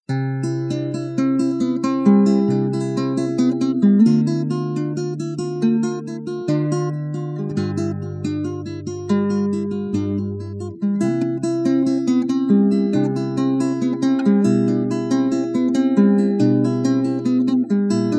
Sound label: etud c dur clock; Этюд №1 до-мажор "Часы" (с/с)